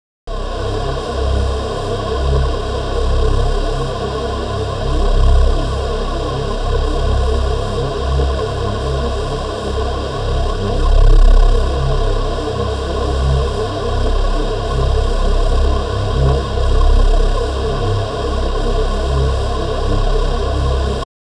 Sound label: Breathy Sound; Bizarre Planet